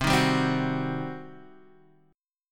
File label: G Major 7th Suspended 4th Sharp 5th C